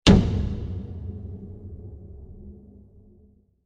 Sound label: Bright Wood Impact; Blasts; Impacts; Crafted Signals